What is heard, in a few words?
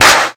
clean hand clap c sharp key; single hits one shots